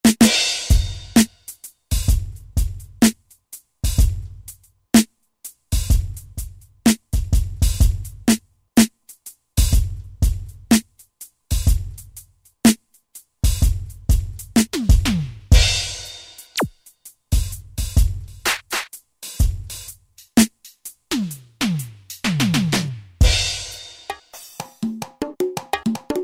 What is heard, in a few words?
Reggae, Hip Hop; Roland SR-JV80-12 Hip Hop Collection